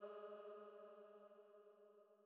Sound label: Female Pad G#2